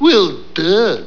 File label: duhhh